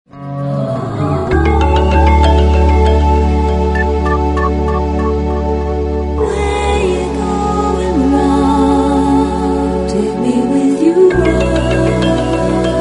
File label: Ringtone